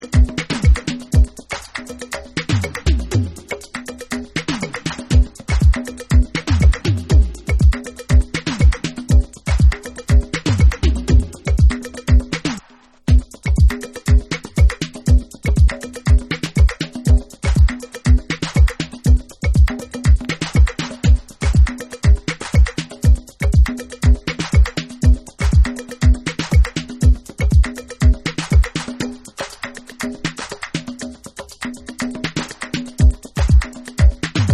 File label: Soul Drumz Mix